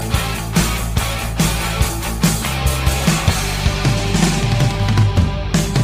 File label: Rock